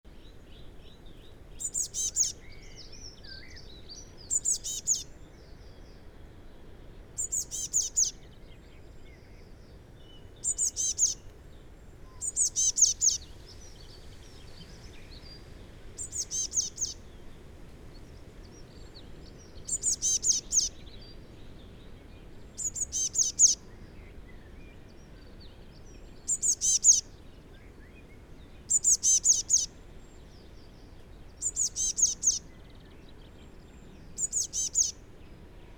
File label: Cyanistes caeruleus; SETE